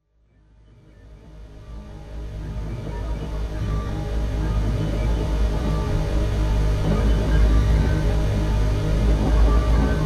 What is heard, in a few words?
forgotten sounds drone; PHOBoS, Blue Hell